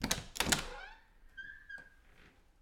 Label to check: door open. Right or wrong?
Right